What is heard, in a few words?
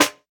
Snare, JUNGLE BREAKS